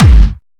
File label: Kick